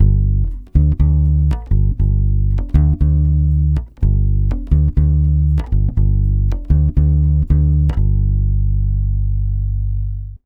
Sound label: 120 BASS G, Brazil Bossa; USB Soundscan; AKAI